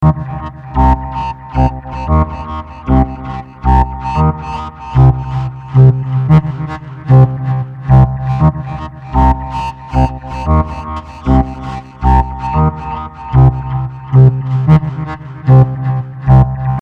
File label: bassline